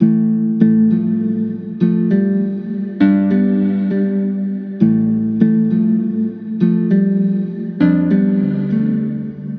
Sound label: Musical Loop 100 A Minor; Impression; Ghosthack